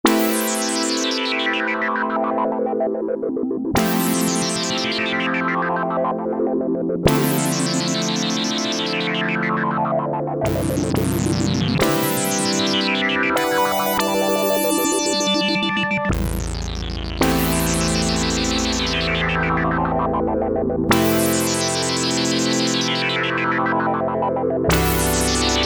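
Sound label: Quint Filter Trill, Memorymoog Classic Analog Polyphonic Synthesizer; 75 Quint Filter Trill; Factory programs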